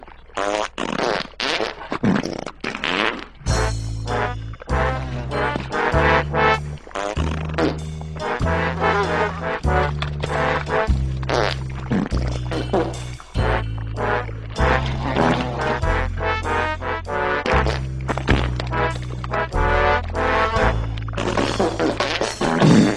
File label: Jazz